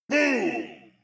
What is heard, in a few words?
boom; Sunset Overdrive